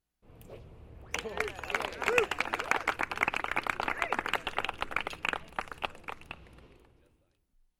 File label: Applause and Cheer in a bar